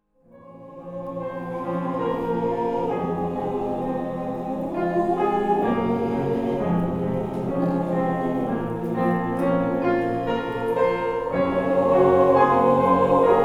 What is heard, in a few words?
satb